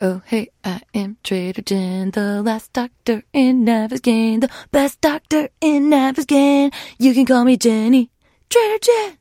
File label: singing; 商人杰恩（七日杀）